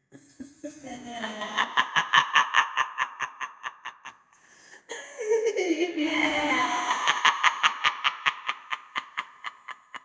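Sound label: evil female sinister laugh; horror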